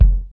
clean bass drum one shot f key, Royality free bass drum sound tuned to the F note; kick drum samples